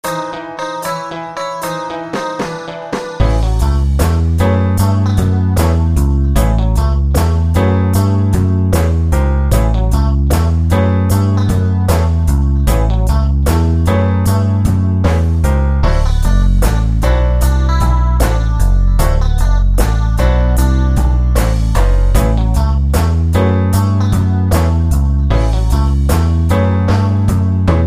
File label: 12 Bar Blues boogie woogie bass line in A; Chord Progressions